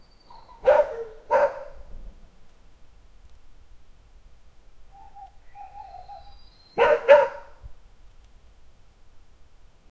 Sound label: the lambs relieved bleati